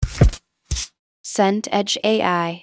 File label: whoosh mid